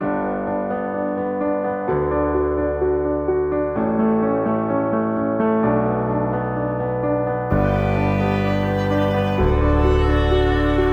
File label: Medical Happy; technology